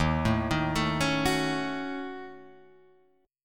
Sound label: D sharp Minor 7th Flat 5th D sharp, down; arpeggiated; JGuitar